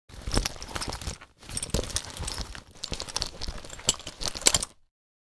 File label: Police duty belt gun belt